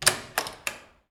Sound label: metal door; svencoop; twhltower